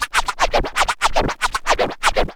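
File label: scratch, record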